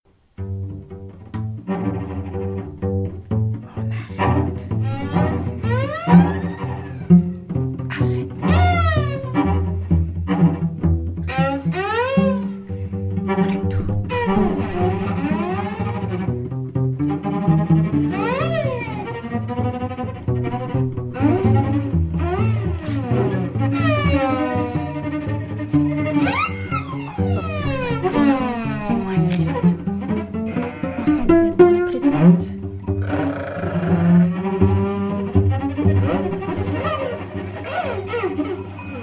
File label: jingle